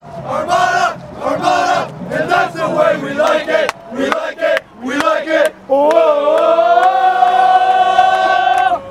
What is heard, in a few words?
crowd chanting raw material